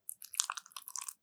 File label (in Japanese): くちゅ音, ASMR